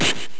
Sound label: Hit; MMBNMMOP